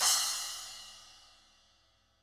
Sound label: Splash Med Vol